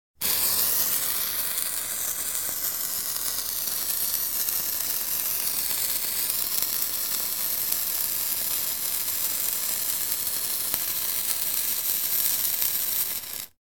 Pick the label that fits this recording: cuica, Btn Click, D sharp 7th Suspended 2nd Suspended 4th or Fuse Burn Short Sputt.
Fuse Burn Short Sputt